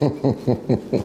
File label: Giggle; why are you gay